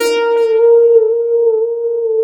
lead (brass) bend; Sonic Foundry (Sony Creative Software); Ugly Remnants VOL-2; Leads

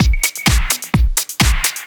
Clubhouse Loop 128 BPM

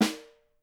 SN M L, Percussion; Miroslav Vitous - Percussion & Harp

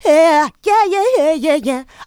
Vocals, Adlibs; Houseworx

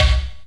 BD; Best Service; Dance Mega Drums

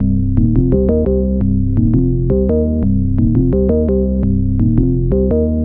Organ Riff; KJ Sawka; Drum n Bass & Beyond

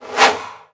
fx reverse; Ueberschall; Techno Trance Essentials

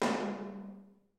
TOM TOM, Roland

